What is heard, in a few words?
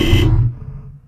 DRUMMM FREAK, DRUMS + PERC